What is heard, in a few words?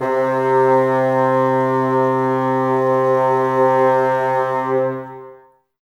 Bassoons C 3; Miroslav Vitous - Woodwind Brass Ensemble